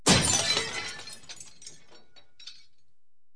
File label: glass; christmas lights